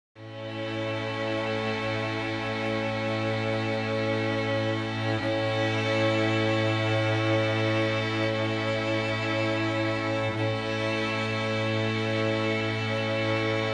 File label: Cool Waves (Instrumental)